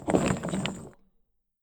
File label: large rusty chain drag